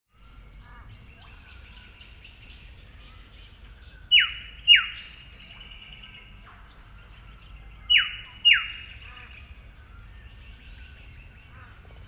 Eopsaltria Australis; Eastern Yellow Robin; Call; Call 2

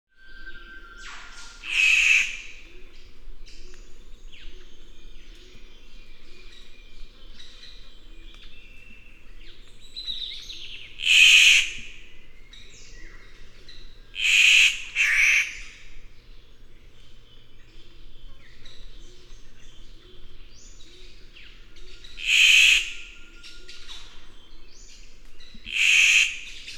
Ptiloris Victoriae; Victoria's Riflebird; Paradisaeidae (Birds-Of-Paradise); Call 2

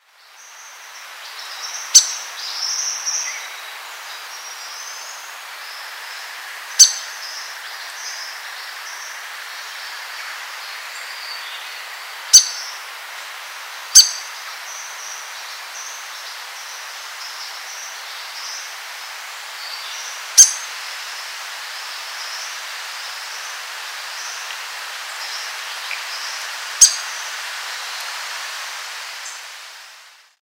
Rhipidura Albiscapa; Grey Fantail; Family - Rhipiduridae (Fantails); Call; Call 2

